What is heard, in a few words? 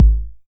909 BD; Goldbaby Tape808 & Tape909; The Tape 909